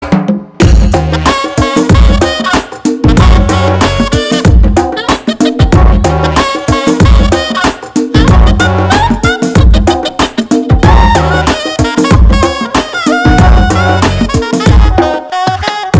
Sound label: Music Ringtone; Nannaku Prematho